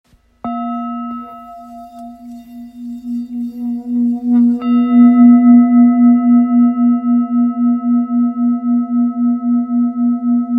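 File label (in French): Bol full moon si, bols chantants tibétains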